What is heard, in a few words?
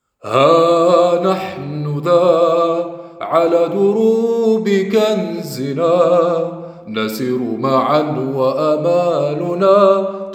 childrens song